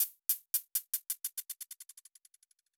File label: hihat pingpong; Polyend; Recue